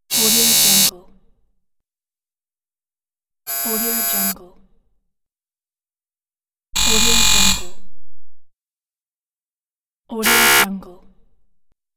door buzzing; livingroomclassic

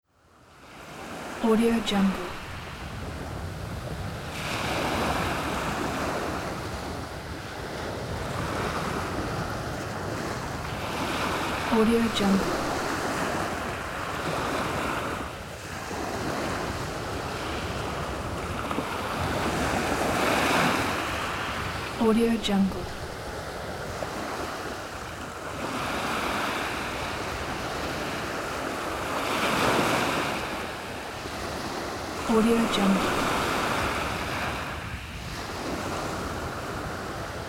windy beach

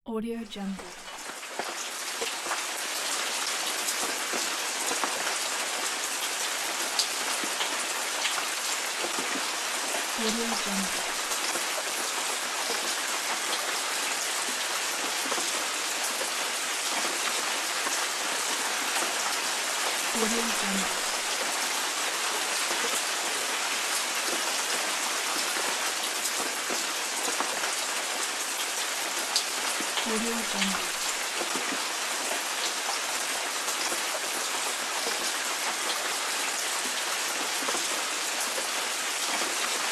rain; blackdragonfx

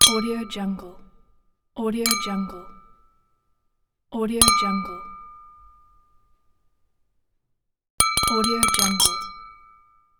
glass clink

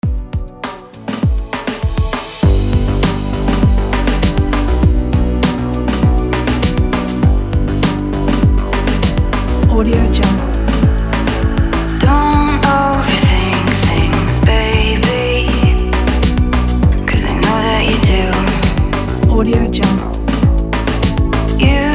modern luxury fashion world

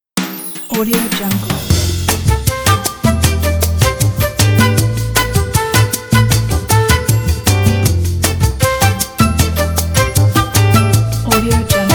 bossa nova lounge jazz

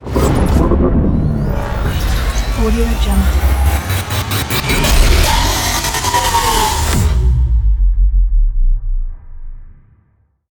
transform logo

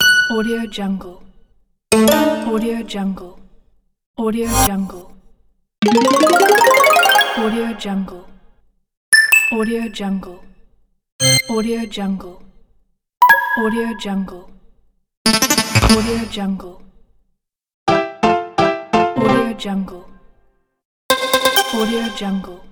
arcade sfx

